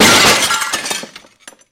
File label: Glass Impact Break; windows & mirrors